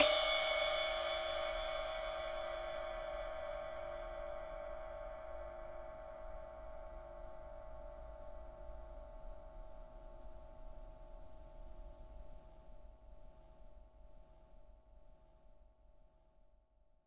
ambient ride single shot d key

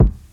phat kickdrum sound g sharp key

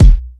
smooth kick sound b key

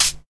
clean closed high hat sample e key

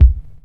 low kick b key

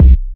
hot bass drum one shot c key

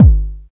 kick single hit G sharp key

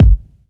low tone kick drum sound g key; kick drum samples

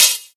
2000s sharp hat sample f key

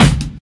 rich highs kick drum G key

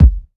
clear kick c key

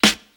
smooth snare drum g sharp key; snare drum one shots

